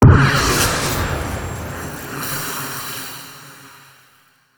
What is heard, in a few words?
Energy - electric fuse